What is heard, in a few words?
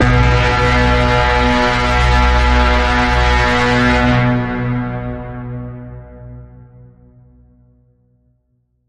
Bend, B